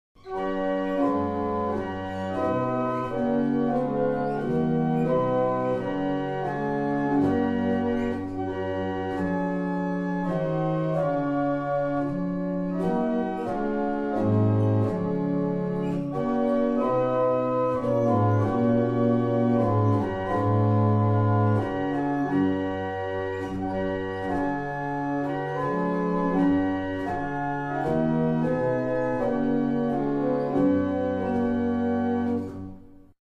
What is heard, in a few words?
barrel organs; Bressingham